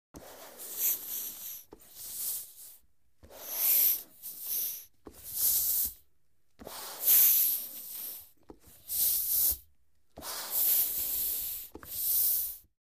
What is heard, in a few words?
Biking Slow Pumping